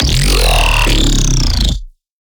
Riddim Bass Hit Screamer Growl; One-Shots; Ghosthack; Riddim Essentials Volume 2

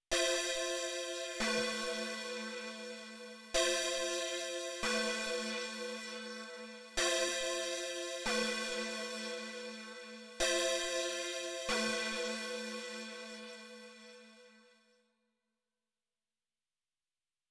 140 BPM A Min Synth, loop stems; Cymatics - 2022 Melody Collection - Wavy; Vapor

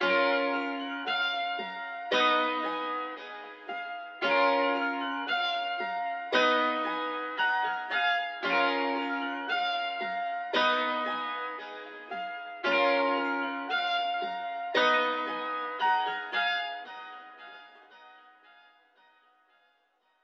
114 BPM D# Min Keys High; Cymatics; 2022 Melody Collection Platinum Expansion

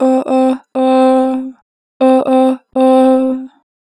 Oh, Harmor Vocals; 2 bar flat; Pitch Flat

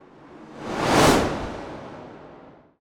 Transition Batflaps, Whooshes; Ghosthack

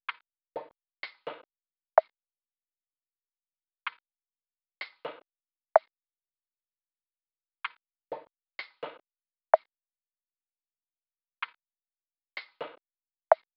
Drum Loop, 127 BPM Percussion; Loop Stems; Cymatics; Vibes II Drum Loop Collection; Matriarch Drum Loop

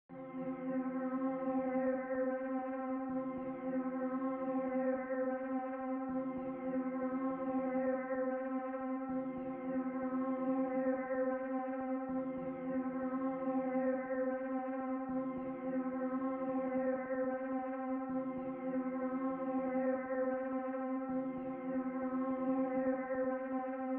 160 BPM C Min Moog One FX; Stems; Cymatics; Aquamarine

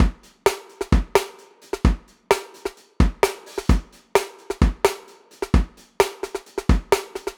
Break Loop 130; Drums; Loops; Ghosthack; Ghosthack - Drum Hero 5; Suppress

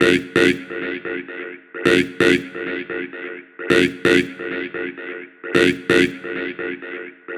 Vocal Loop 130, Techno; Techno Sounds 2024; Vocals

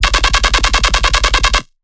Bass Shot F, Dubstep; One-Shots; QuackAttack; Ghosthack Advent Calendar 2021 - Day 16 - Dubstep Basses

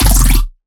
Bass Squelch, Dubstep; Bass One Shots; Bass X Squelch; Ghosthack; Dubstep Sound Arsenal; 50 Bass One Shots

